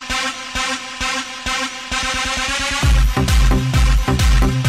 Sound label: Boy Attitude Music Ringtone; ringtones